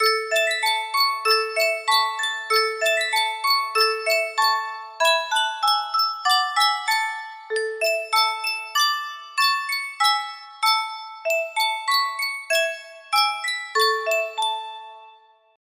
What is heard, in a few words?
music box melody, caja de musica; sankyo